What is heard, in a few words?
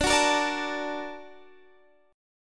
A sharp Augmented Major 7th